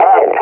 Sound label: pain, combine officer; Half Life Alyx; Half Life Model Pack